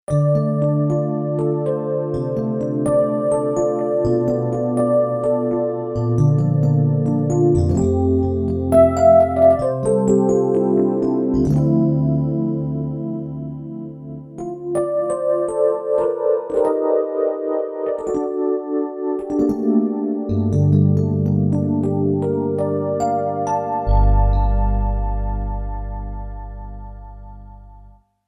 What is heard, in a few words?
SA Rhodes, Roland JV-880; Factory Patches